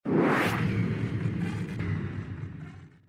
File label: Entering Snd